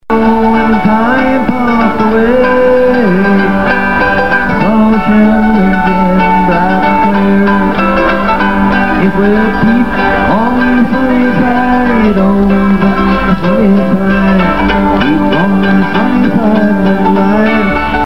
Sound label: Country